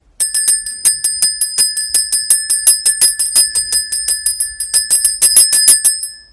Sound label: chisel bell loud